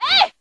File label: ah; ooh ahh